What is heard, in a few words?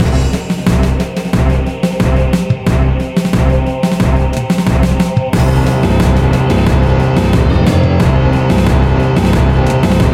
Cinematic Boss; Hunter's Legacy Purrfect Edition